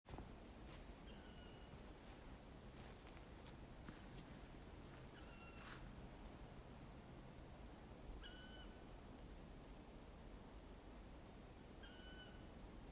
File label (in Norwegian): fugl